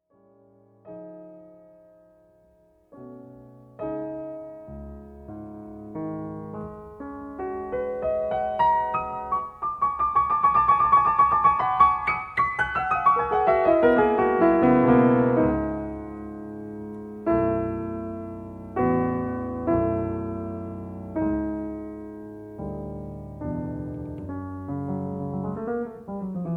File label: piano sonata